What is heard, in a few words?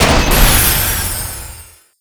bumper car hit hard; tf2